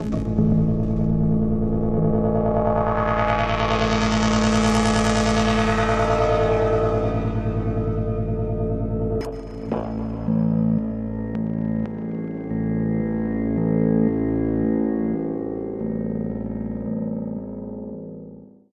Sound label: Atomic Throb Music Pad